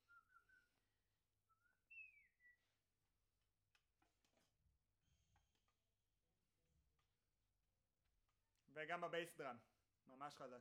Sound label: Trumpet Room